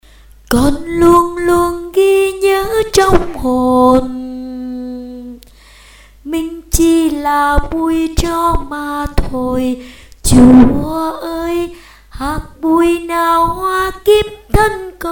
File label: basso